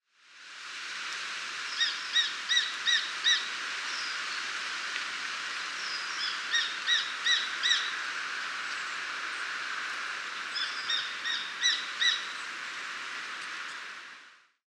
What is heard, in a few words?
Accipiter gularis; Kleine sperwer; roofvogels; Accipitrinae; Accipitres; Accipitidae